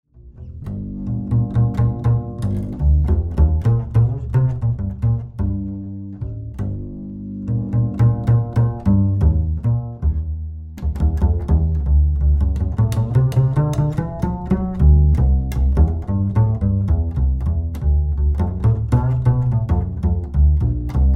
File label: Waltz